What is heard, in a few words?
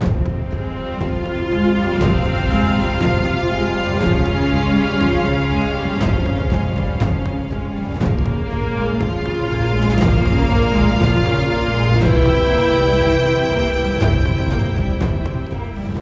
String; Assassin's Creed Unity Dead Kings